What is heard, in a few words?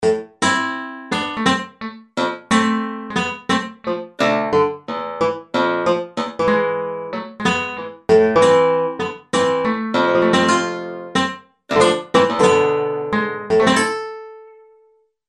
nylon guitar hard; fleta